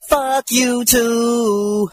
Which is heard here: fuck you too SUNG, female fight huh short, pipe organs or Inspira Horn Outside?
fuck you too SUNG